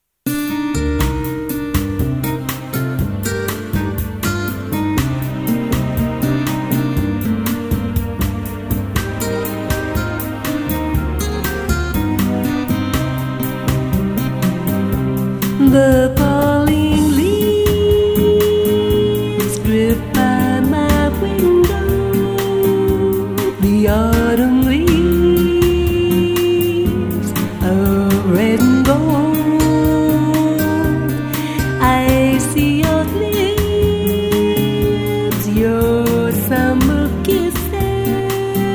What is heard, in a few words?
Jazzy Latin